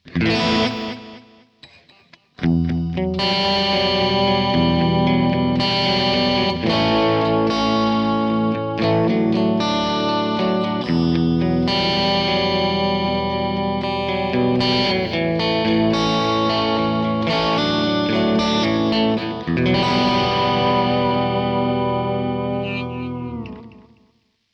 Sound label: Ambient Echo Mod; MXR Joshua